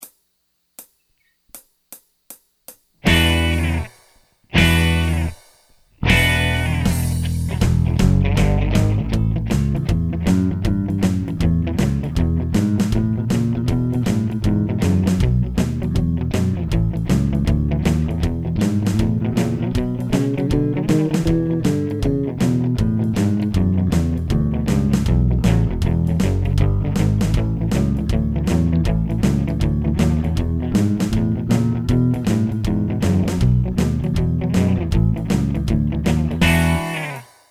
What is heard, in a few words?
Backing Track